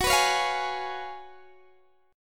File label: Gb Major 7th Suspended 2nd Suspended 4th, Guitar DADGAD